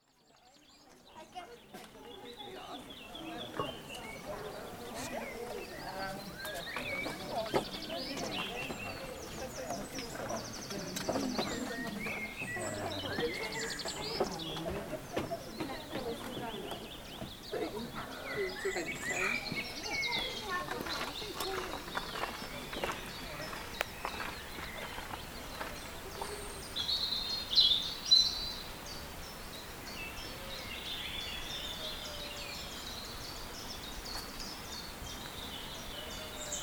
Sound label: Welsh Valley Alpacas Soundscape